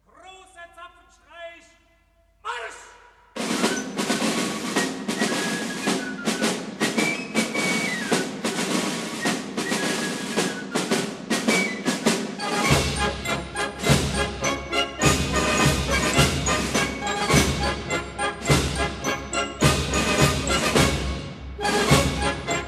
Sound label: Marsch; Grosser Zapfenstreich; Heeresmusikkorps 9